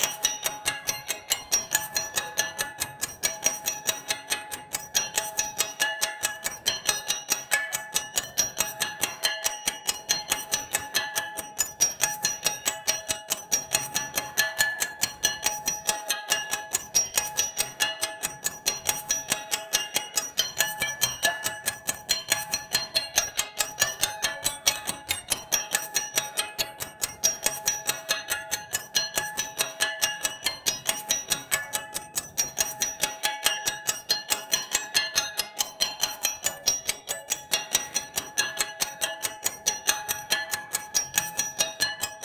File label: sound metal steps; materials